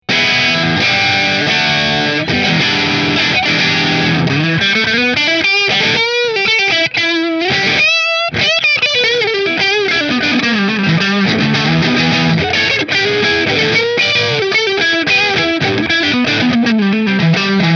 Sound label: Hamer Aztec Gold Middle Marshall